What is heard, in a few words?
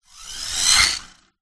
leisure radio controlled car pass; zapsplat